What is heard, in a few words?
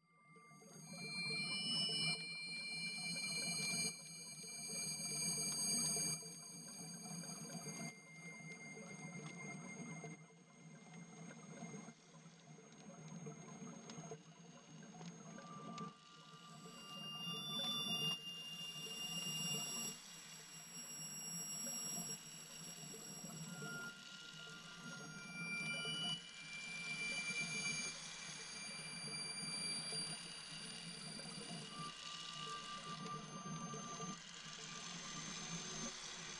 Music Neutral; Sifu; H3 Level Area=Iceberg